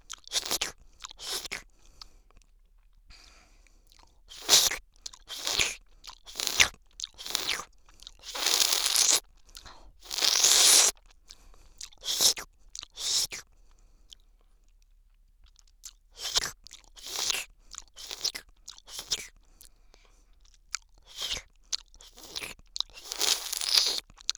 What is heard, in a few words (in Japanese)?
右耳アイス舐め